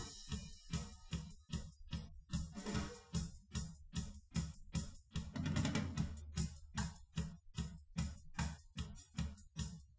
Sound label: wdrums liverec; SiSEC08; SiSEC underdetermined